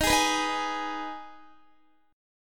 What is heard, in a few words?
Eb Suspended 4th Sharp 5th Eb Guitar 4E 4A 5D 5G 5C 6F; Ebsus4#5; strummed